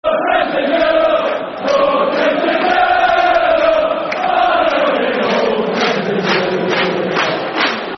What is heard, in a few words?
No Tims In Europe, Football Chants; Rangers GRFC